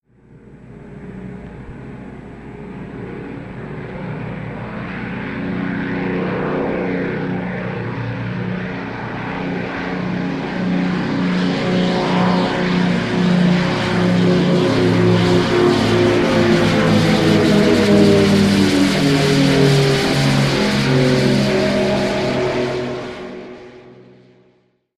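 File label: Plane P38 Pass By Slow; ww2 fighters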